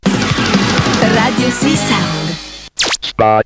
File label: JINGLE SPOT